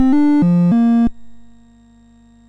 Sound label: sid, MOS6581R2; Donkey Kong 1983